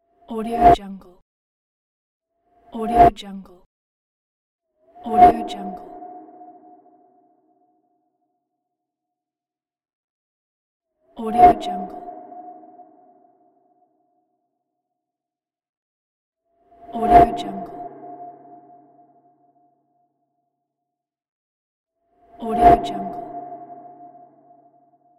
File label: wind whoosh; transitions and movement